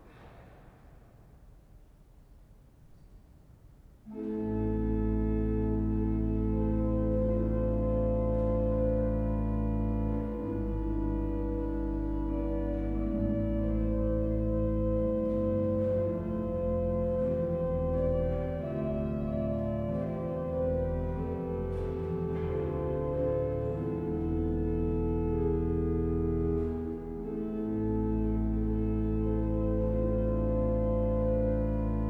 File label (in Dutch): Intermezzo, orgel; Diever; Garmt Bouwman